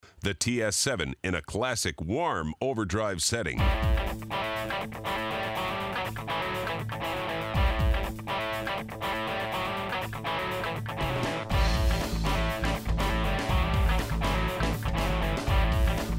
TS7 Warm Over Drive

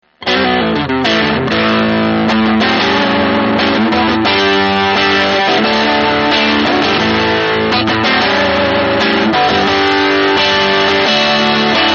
hi voltage

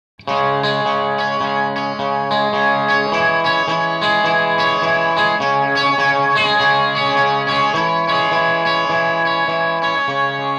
12 Stringer

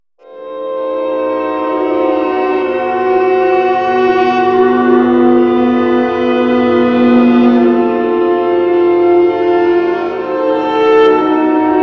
mad orchestra; V-Synth

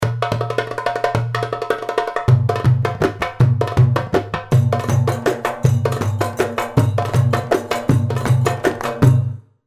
Tom Tom 080, darbuka

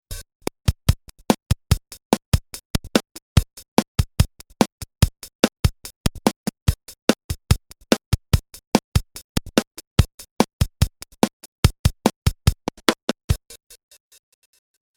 Skip Along Beat

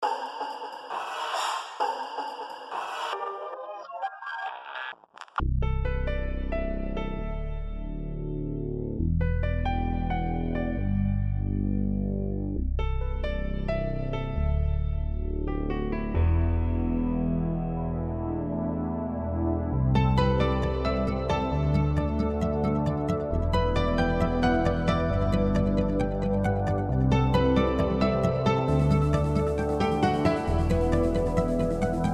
trance

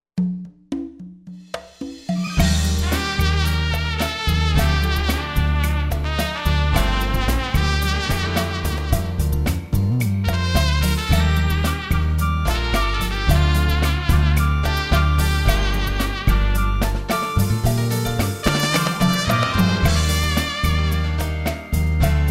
trumpet

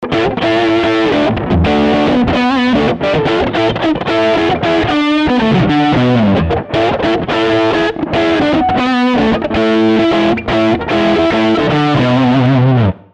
Slow Hand Bluze

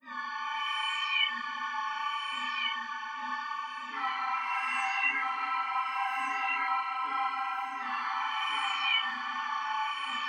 Glass Atmosphere

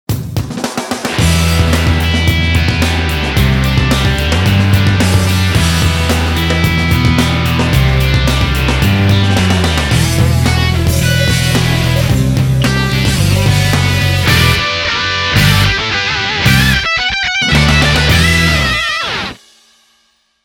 Rock

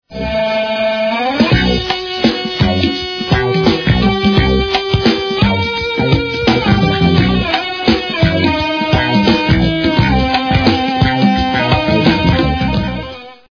chili

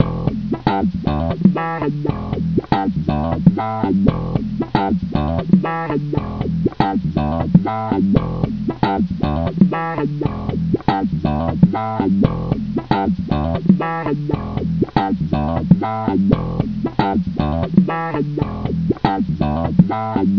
cry bass

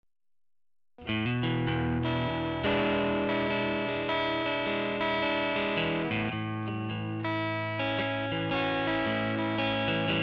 tremelo

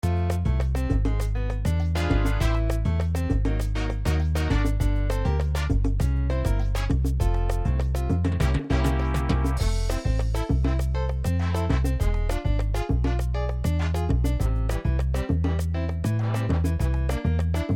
salsa

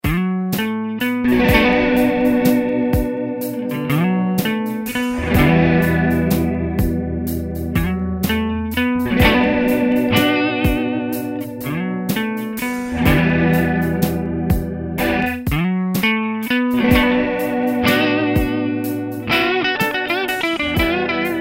Rotory

